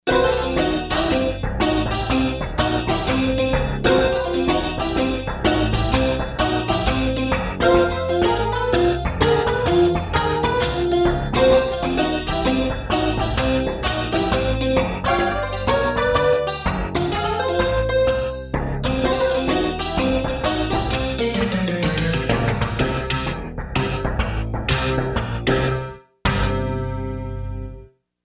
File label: Doot Dit, Korg MSC-2S Synth; Synth 1